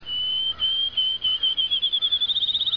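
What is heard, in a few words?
field sparrow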